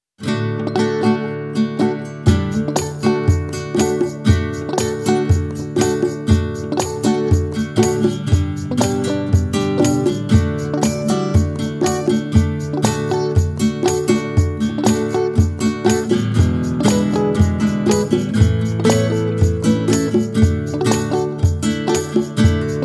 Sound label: tutira mai nga iwi instrumental